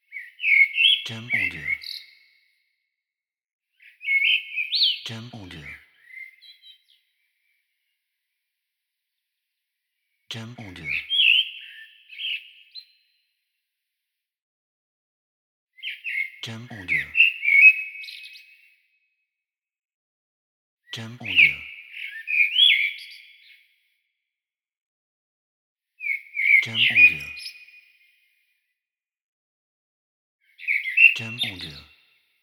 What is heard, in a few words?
Birds